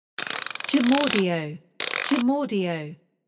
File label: Spring Mechanism Winding